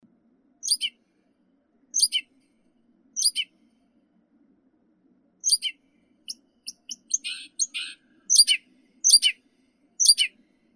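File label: blyth's reed warbler, acrocephalus dumetorum; kamishovka sadovaya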